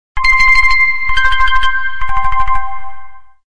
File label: Xylophone